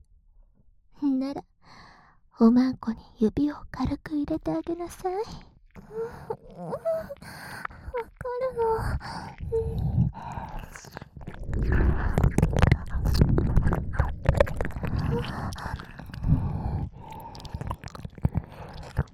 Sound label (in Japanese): 耳舐め